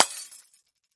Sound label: Christmas Bulb Crash; housewares